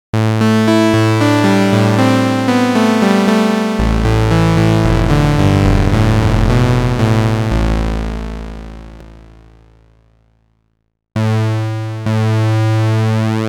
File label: yamaha ex5r sounds fdsp pwm sawth raw and pwm